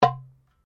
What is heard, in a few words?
Drum Darabuka Slap Mute, Egyptian Darabuka Drum; Commanly available Egyptian Darabuka Drum; Musical